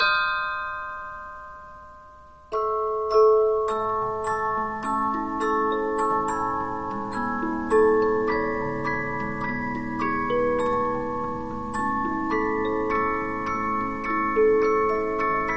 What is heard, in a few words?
Polyphon, 15inch disc musicbox